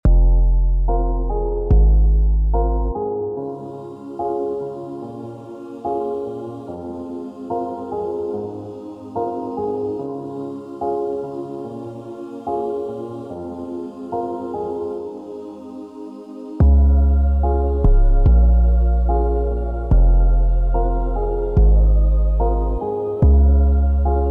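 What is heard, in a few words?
DarkLoFi 145 c# Major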